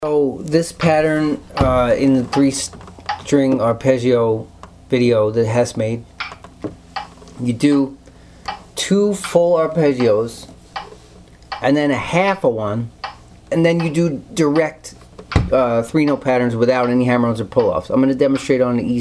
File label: 3 string arpeggio pattern; sweep picking